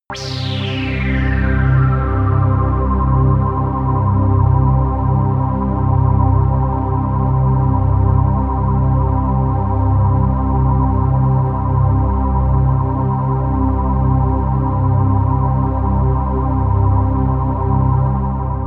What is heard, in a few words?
pad sweep buzz; flaechen